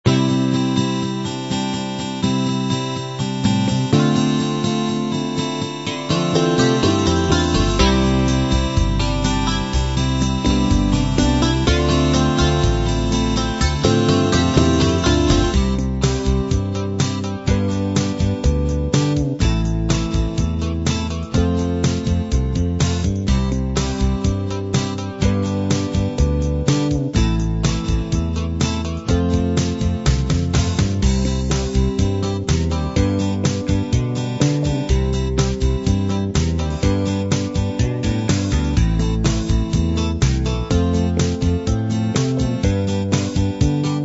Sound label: light rock combined